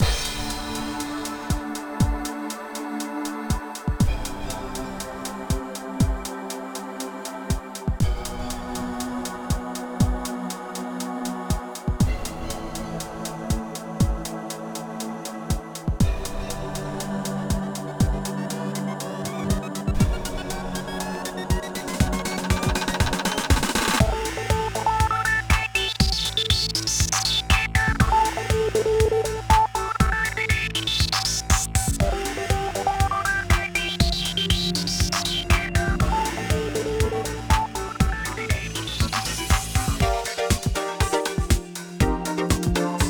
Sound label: Trance; Tetris Worlds (PC)